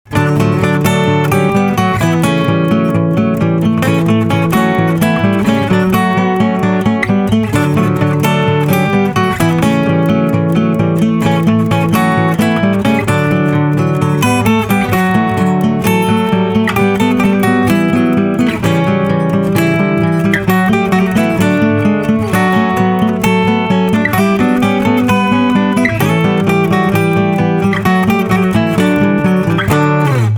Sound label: Acoustic; Pokemon Center